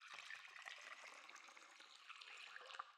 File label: spill